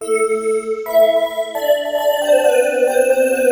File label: Synt